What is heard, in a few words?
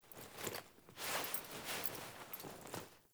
Foley Emote Default Wave, Foley Default Clothing; Characters; Player